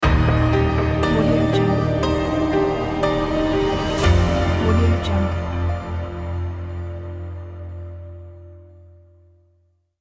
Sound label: ambient logo reveal